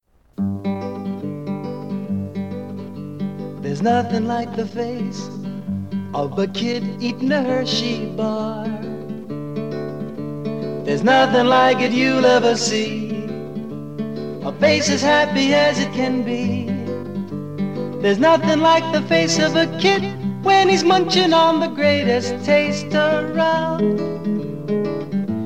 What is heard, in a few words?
Jingle